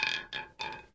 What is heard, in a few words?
ball soft stop, roulette; frenchroulette3